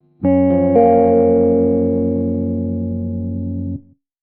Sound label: Guitar One Shots F#maj7; Guitars; Ghosthack; Advent Calendar 2019; Xmass